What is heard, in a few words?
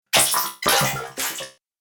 Droid Wet, robot